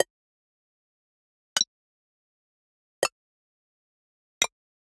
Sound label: Generic Click Digital; boom library; The Interface